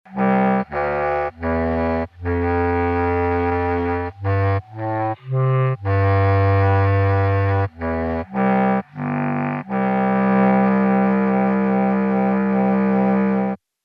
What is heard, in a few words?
Mellotron M4000D Bass Clarionet; MusicMaster